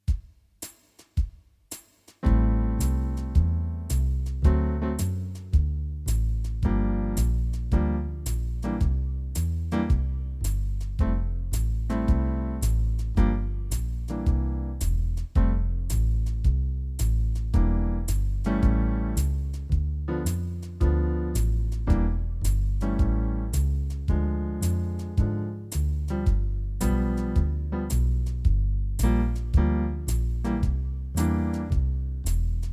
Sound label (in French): grille II V I, guitare